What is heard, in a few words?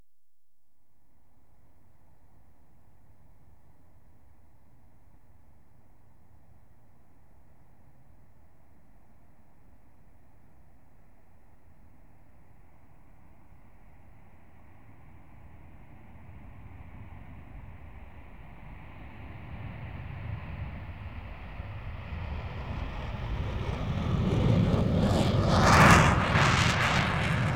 TAKE OFF, Aircraft; The BBC Sound Effects Library